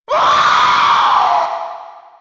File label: pain; ragemap2023; slasher; slasherghost